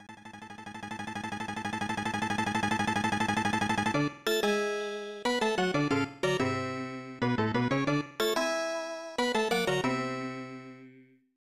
Piano Solo; Lurch's Piano Solo 3; The Addams Family; SNES

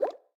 Lagoon Water Bubbleburst; Zombie Catchers

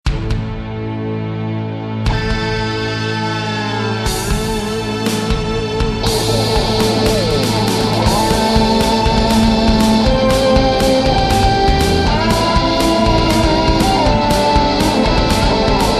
Engine Room; ACE Online AirRivals; G-ARK

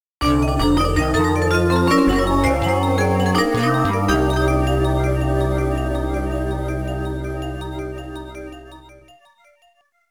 Logo; Super Fighting Robot; arcade

